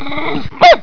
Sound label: woof